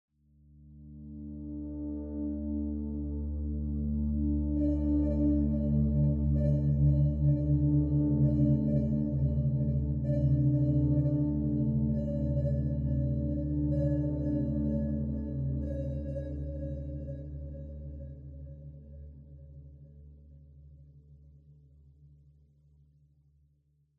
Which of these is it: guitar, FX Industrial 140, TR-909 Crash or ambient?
ambient